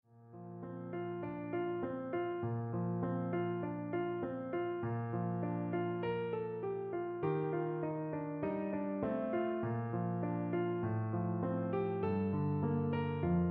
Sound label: Feed My Sheep medium acc